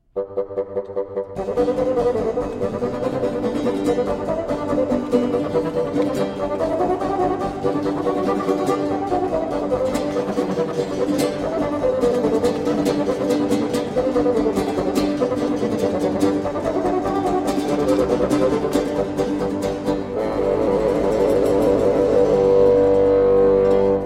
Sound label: Sonata V in G major Presto; Corrette; Le Phenix; Les delices de la solitude